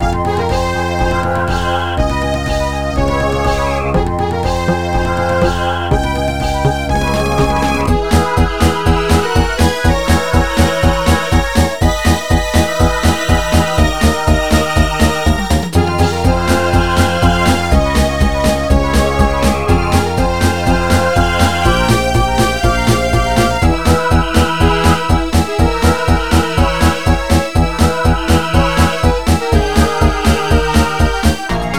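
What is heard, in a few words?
background music level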